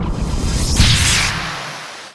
back to normal; uumuu

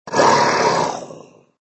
Zombie Pain; Extra Lives

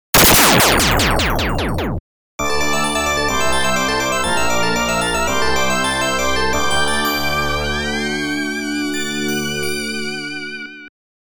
GAME OVER (Game Over)

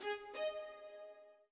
orbit violin; Sky Children of the Light gamerip

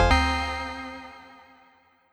Key Pickup C

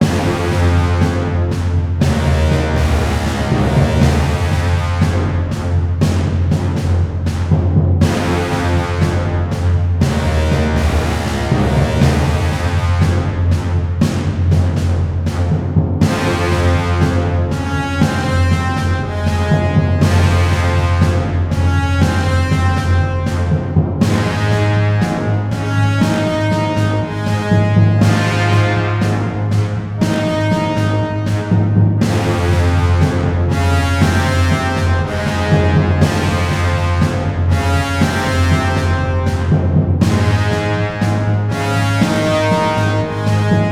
BGM General Loop; Sanabi

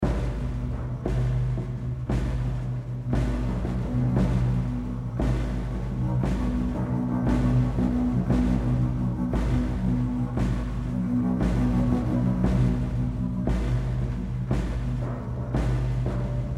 Intense Drums and Battle Horns

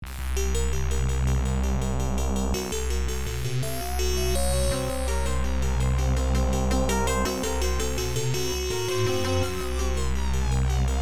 Round BGM; Pitapat; X68000

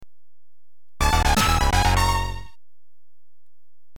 level 3 - completed, soundtrack

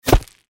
bullet impact dirt; Out of Reach: Treasure Royale

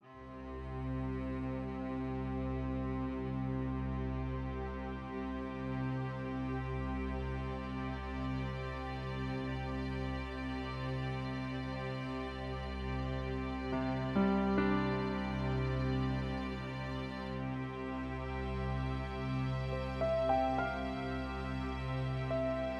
bahestras ambiant Fair; edge of eternity

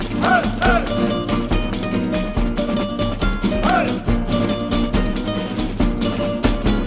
music world music shooter swarm

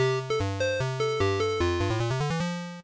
Jingle, Start; Donkey Metronome - Start; Nintendo DSi

